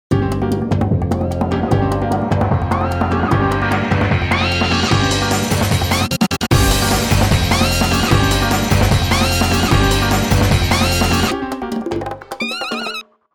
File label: Fuzz n Jazz